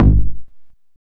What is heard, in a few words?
combidrums, techno; single-drums